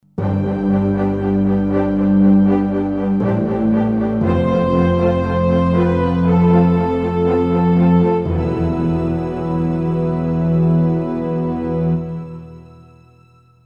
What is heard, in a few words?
performance orchestra, roland jv2080